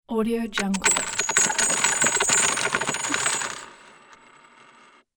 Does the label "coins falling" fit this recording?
Yes